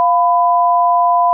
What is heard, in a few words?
Complex Periodic Waveform